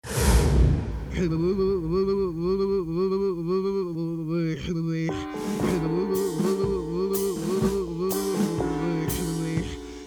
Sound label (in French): marche combat; citypercussion